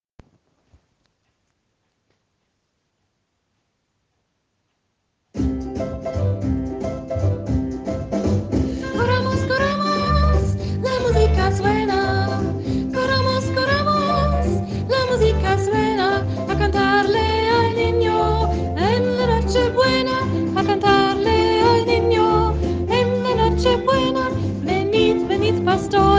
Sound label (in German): Weihnachtslied aus Venezuela